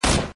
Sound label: QBZ95, ATK